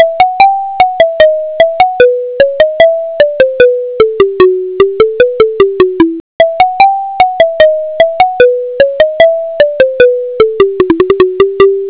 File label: Bourree